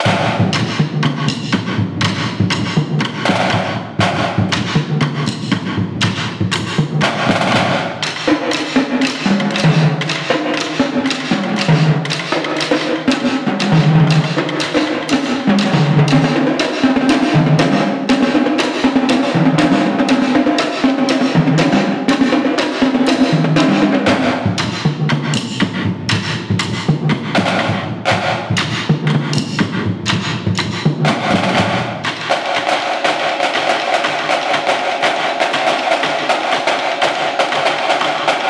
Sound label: Broadcast Fight Song